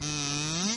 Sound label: kazoo